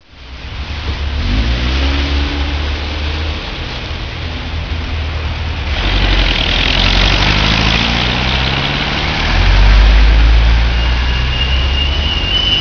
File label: subway st